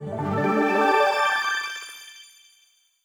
Level Start; sayonara wild hearts 2019 gamerip

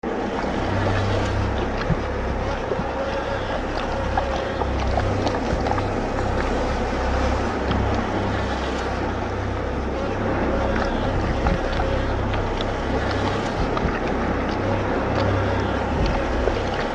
Corruption Block Amb; children of morta; Tutorial

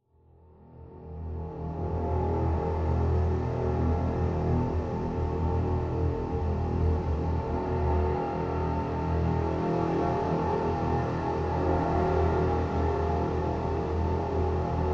Suspence Pad, Low Intensity

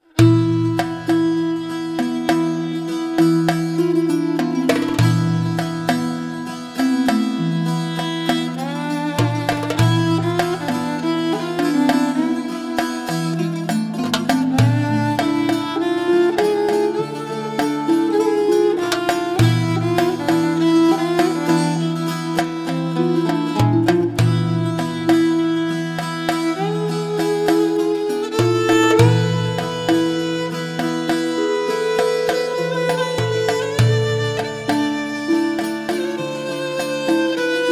Tavern; Two Worlds 2

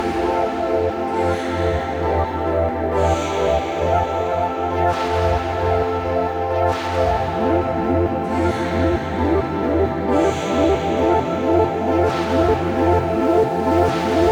Music Car stage Menu; sayonara wild hearts 2019 gamerip

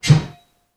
Heavy sword woosh

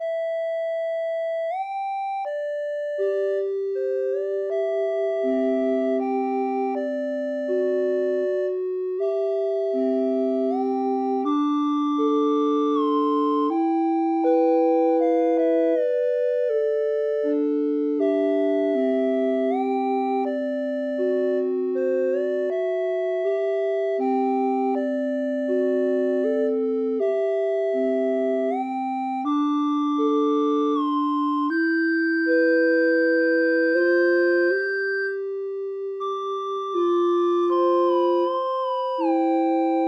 Lullaby – Ancient Tune; Hyrule Warriors Age of Calamity

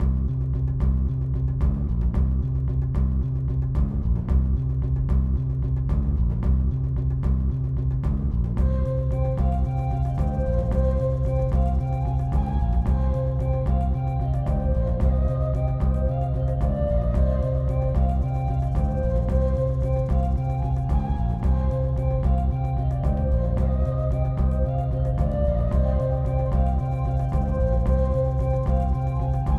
Bonus; primal light linux macos switch windows xbox one xbox series xs gamerip 2020

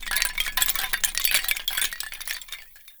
Foley Jingle Long; gamerip; Hellpoint

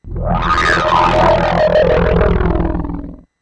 Rise Fall; knights try

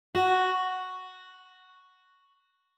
Town Intro Melody; backpack hero gamerip 2022

